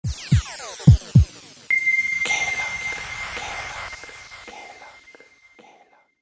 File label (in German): Musik Neumix Logo; Kelag